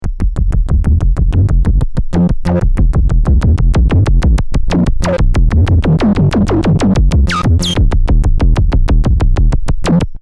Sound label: filter